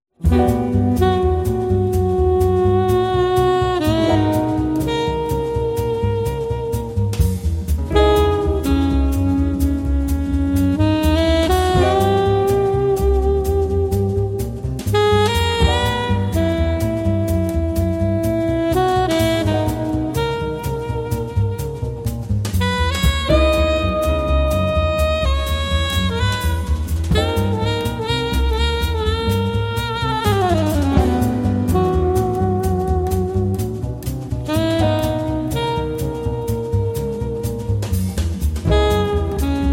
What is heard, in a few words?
fast Speed